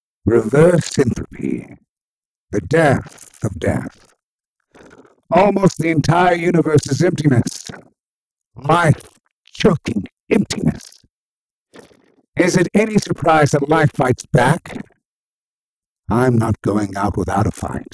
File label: Zombies; Mothership; UCR Audio Horrors